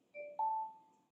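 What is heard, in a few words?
system sound Samsung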